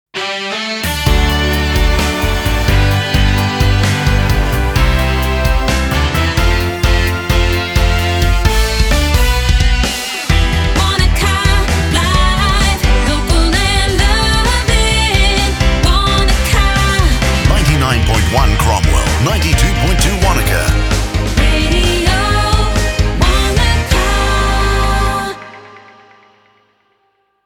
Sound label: Jingle Ramp; Radio Wanaka